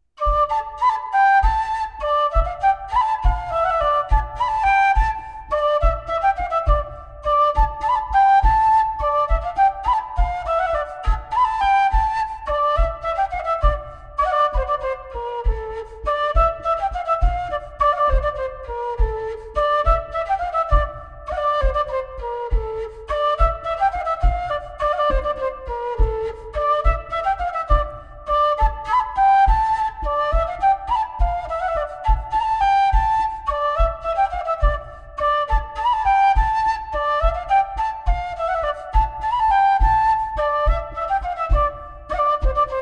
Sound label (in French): Bourree